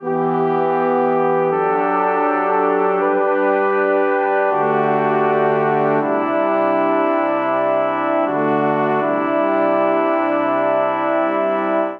Progression Homeward vi V 3 I V VI G Major 80 bpm, Brass Quartet; MIDI Clips; Ableton; Spitfire Audio